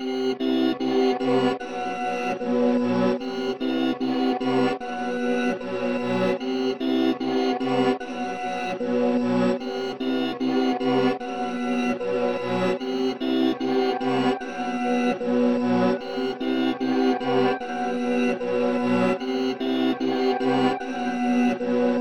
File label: 150 BPM E Min Keys; Melody; Reverse; Loop Stems; Cymatics; 2022 Melody Collection; Breathing